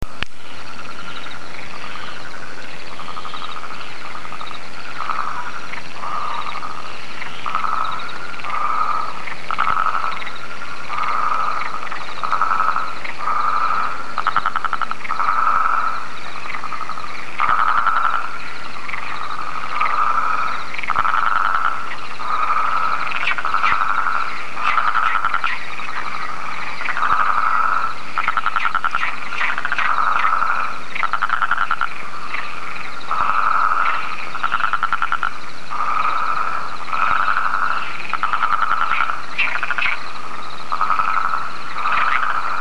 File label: mauritanicus